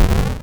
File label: flame; spelunky 1 1